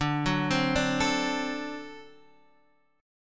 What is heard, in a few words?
D 7th, down, Guitar, Standard; D7 arpeggiated; JGuitar